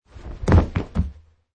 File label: Landing